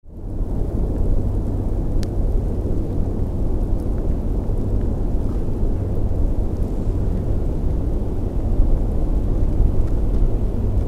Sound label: Mont Royal Winter